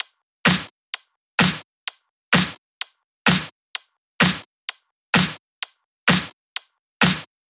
Cutter Drums 1, 128BPM; Vengeance Samples; Cutter Kit

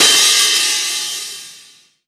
Open Hihat, Cymbals; Vengeance Samples

